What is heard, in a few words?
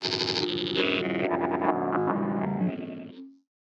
Movement; 99Sounds; InterSpace